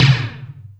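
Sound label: Lo Phase, Alesis D4 drum machine tom; 300 Drum Machines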